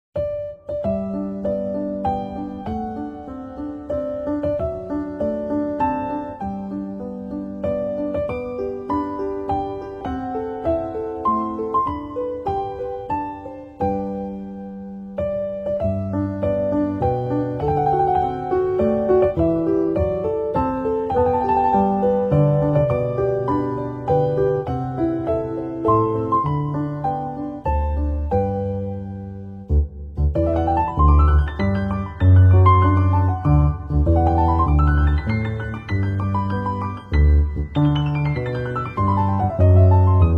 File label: Happy Birthday piano version; Cumpleaños de Noah